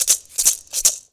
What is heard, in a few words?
MULTI RATTLE; Best Service; World Colours